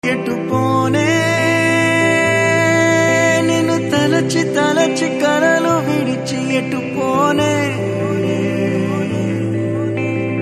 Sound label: Telugu Ringtone